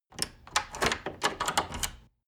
wood door old lock turn key

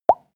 pop bubbles; soundpool

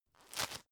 tissue grab; objekte; taschentuch

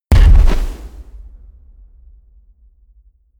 explosion fuel; explosionen; comedy-cartoon; soundpool

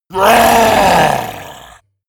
orc scream; schreie

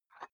dumbbell turn nut in, fitnessstudio

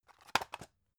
drug package drop deep; berufe; arzt

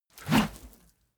whoosh dirty rustle; swooshes-swishes

